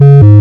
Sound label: viewer disconnect